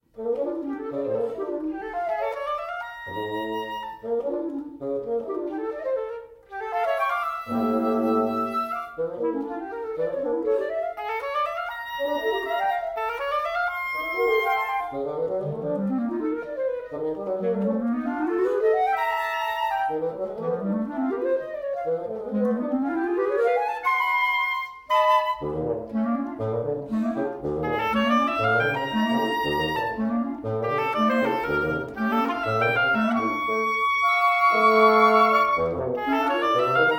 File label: wind quintet scherzo